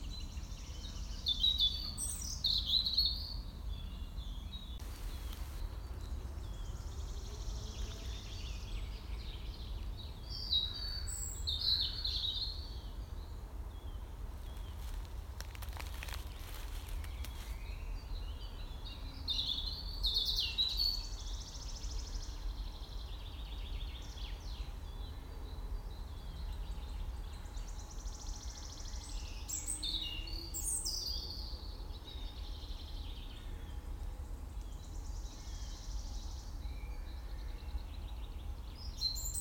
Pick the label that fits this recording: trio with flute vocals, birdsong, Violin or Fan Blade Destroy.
birdsong